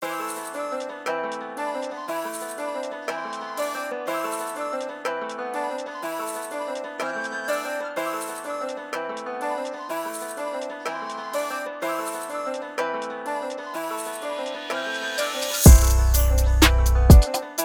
beats

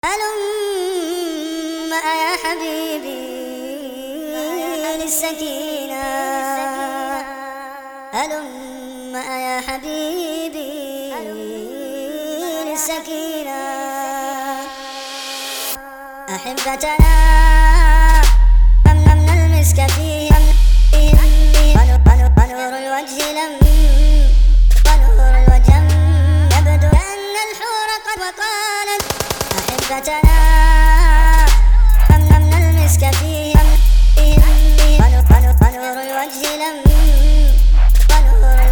ar trap

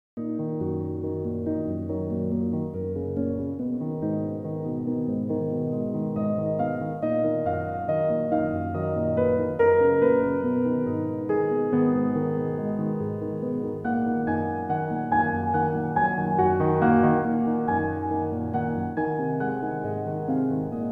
Peaceful Piano

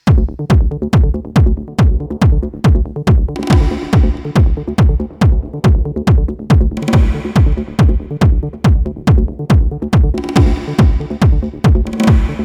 Psy-Trance Techno; Downtempo; Hard Trance; Hard House

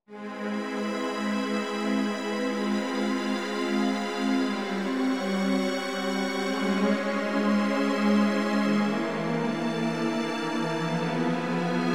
Woodwind Quintet

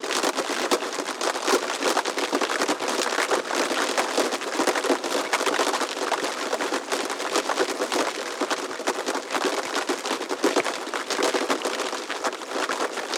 Rain and Nature, Underwater Drops

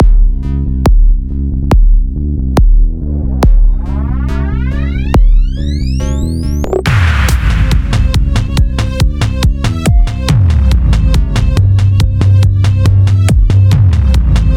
Beat BASS

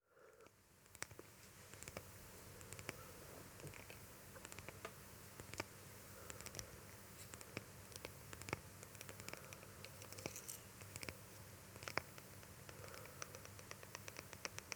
ASMR Tapping White Noise

